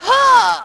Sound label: fall; Splashdown; XBOX; Brit